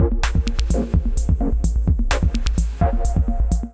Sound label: Pads Dub Techno Kit groove 128 bpm, Drum; MIDI Clips; 111 - 130 bpm; 64 Pads Dub Techno Kit groove; Ableton; Factory Packs; Drum Essentials